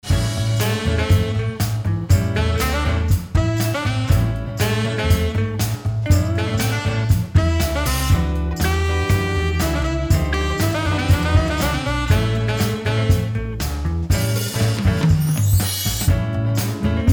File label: Shuffle